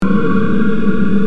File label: silent beast growl